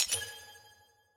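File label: voice chat team push to talk click; premade voice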